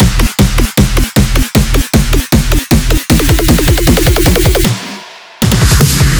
fill loop 155 4 bars; GhostHack

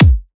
Dubstep Kick; Ghosthack; Dubstep Sound Arsenal

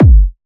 Techno, Kick; Techno Sounds 2024

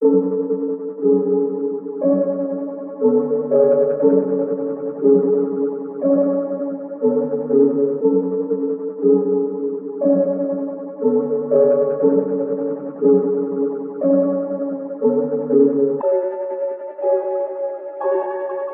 120 BPM F# Min Pluck, Loop Stems; Melody; Cymatics; 2022 Melody USB Expansion; Extraterrestrial

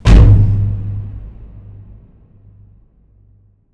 impact large hallow; Hits, Impacts

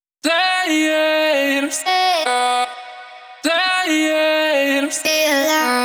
Vocal Chop 150 BPM G# Min; Cymatics; Euphoria Vocal Sample Pack

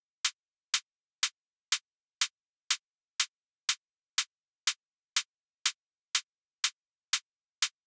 Topline Upbeat Hat, 122bpm; A#min; Construction Kit; Ghosthack; Summer Freebie; Acapella Kit

